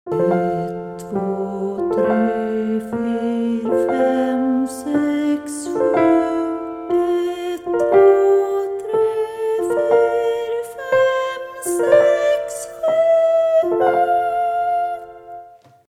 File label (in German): SATB Altlage